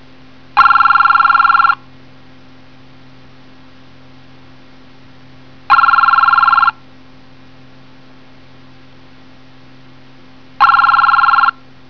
TELEFON

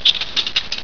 BESTECK

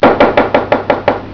klopfen

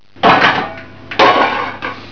klonck

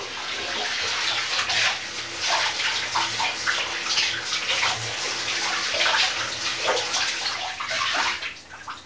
DUSCH

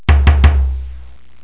nageln

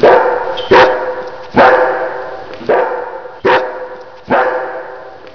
Hund

